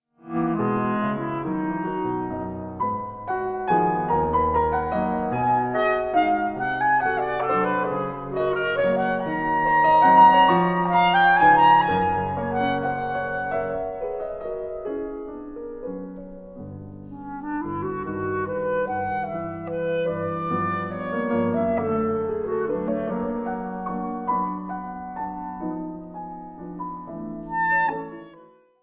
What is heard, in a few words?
sonata for clarinet and piano; op 84